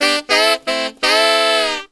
SAX, W.AFR.SAX; Spectrasonics; Heart of Africa

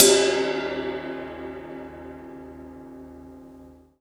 CYMBAL, RIDE; Sonic Foundry (Sony Creative Software); Drum Tools; Laidback

